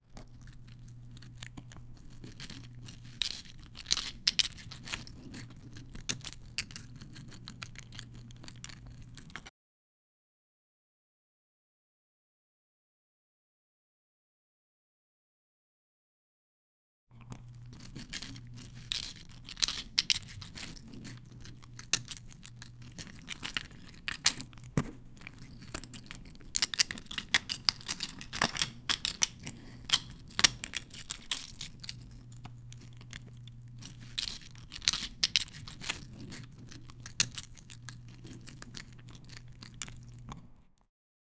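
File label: cartoon eating a cheeseburger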